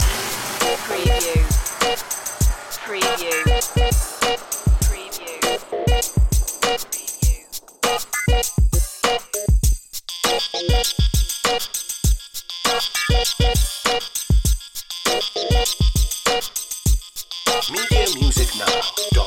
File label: cool cat loop